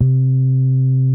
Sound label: DYNA SOFT C4, DIGITAL BASS; Music Store - Claudius Diemeter Schwerlos